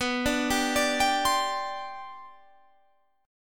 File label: G Major Add 11th, B, Gadd11/B chord; arpeggiated